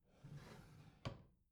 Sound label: bathroom drawer close soft